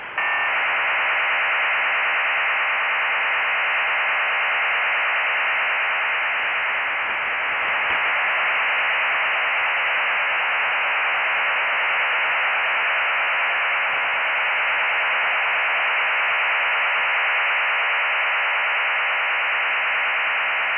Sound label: STANAG 4481; NATO STANAG 4481 PSK waveform via Thales TRC-1752 modem with 5 bit synchr. ITA2 KG-84 encrypted traffic; PSK-systems